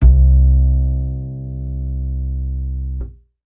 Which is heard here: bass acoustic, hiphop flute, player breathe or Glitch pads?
bass acoustic